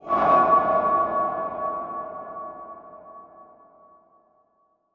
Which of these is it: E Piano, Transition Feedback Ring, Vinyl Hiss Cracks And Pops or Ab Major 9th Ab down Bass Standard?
Transition Feedback Ring